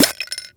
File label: Magic Harvest Orb; Levels Hub Swamp 03 Cursor Harvest; Orb Release